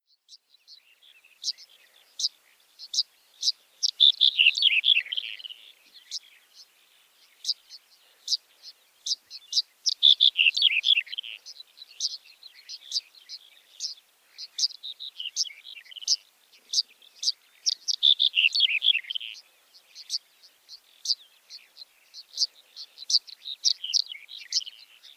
Red-headed Bunting

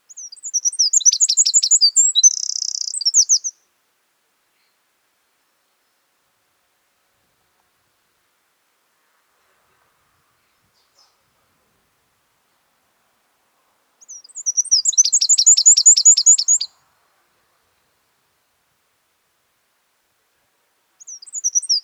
Northern Wren

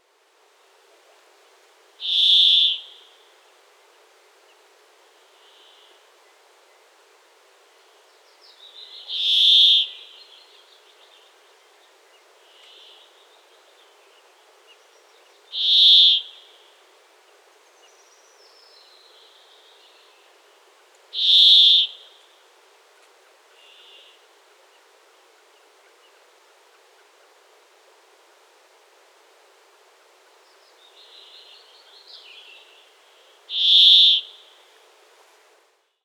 Brambling

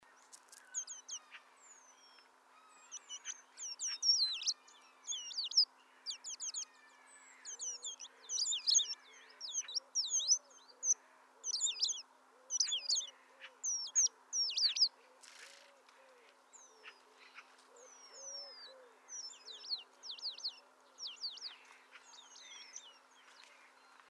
Eurasian Penduline Tit

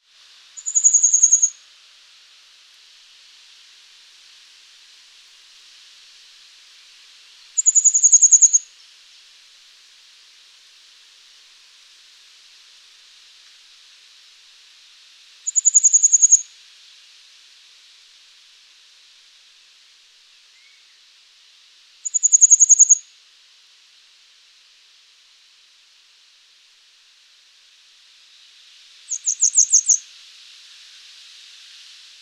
Grey Wagtail

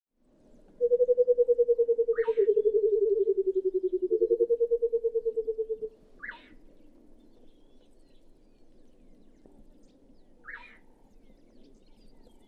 White-browed Coucal

